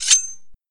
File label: melee equip